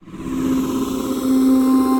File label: Multi Drone Machine, ambient & evolving; Ableton; Glitch and Wash